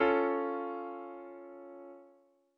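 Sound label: Db augmented chord; ackordtabell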